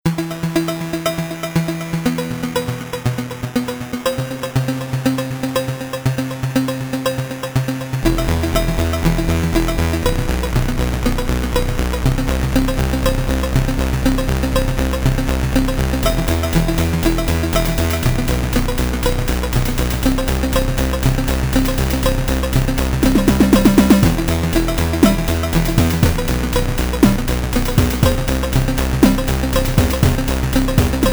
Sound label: 8 bit generator